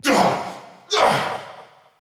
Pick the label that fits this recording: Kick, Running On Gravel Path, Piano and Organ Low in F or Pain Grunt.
Pain Grunt